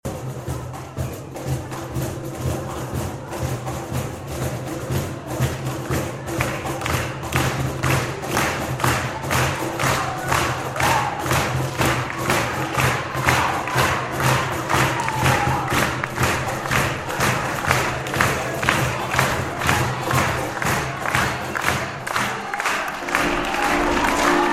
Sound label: Green Drums